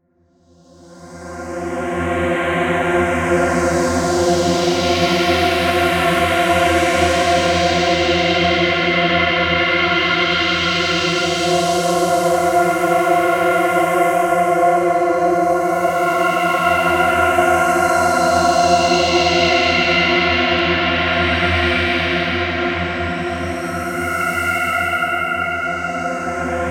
Pad design

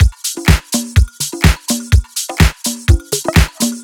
Disco Bellz, Drum Loops, 125 BPM; Sonic Foundry (Sony Creative Software); Underground UK House & Electro

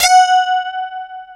DS8 F#4, Korg

